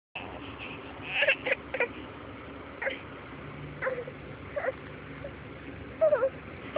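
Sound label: baby hungry, male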